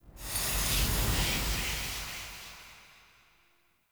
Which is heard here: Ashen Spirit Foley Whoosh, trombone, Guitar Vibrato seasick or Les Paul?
Ashen Spirit Foley Whoosh